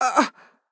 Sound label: AHurt; Garnet